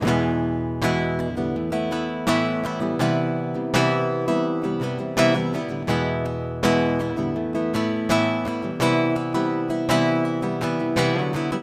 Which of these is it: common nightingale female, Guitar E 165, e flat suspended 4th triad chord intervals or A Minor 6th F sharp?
Guitar E 165